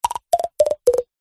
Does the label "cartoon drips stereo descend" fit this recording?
Yes